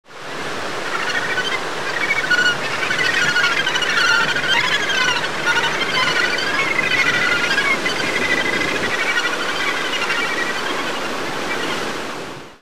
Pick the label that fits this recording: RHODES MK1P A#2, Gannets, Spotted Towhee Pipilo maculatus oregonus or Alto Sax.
Gannets